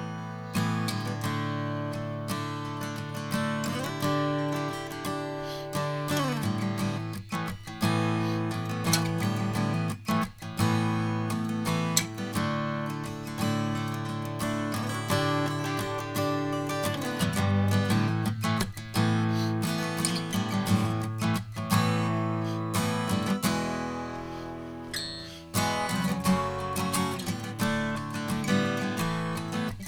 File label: Ac Gtr U87 EQ; Pro Tools Essential Demos; Digidesign